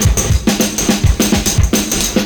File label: 70s Breakbeats; USB Soundscan vol.46; AKAI